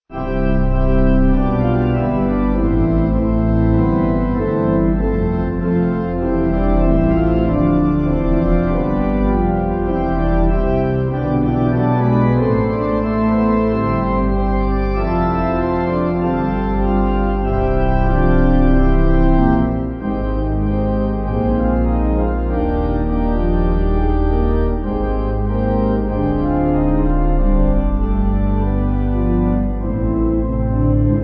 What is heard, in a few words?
Pipe